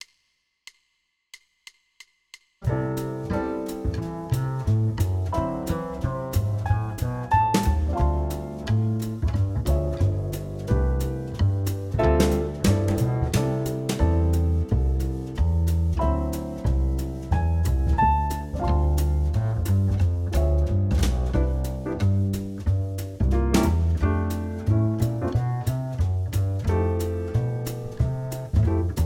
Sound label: Jazz Etude; Jazz Etude #6